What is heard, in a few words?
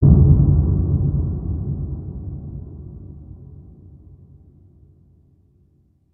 Cave Ground Rumbles; ninjago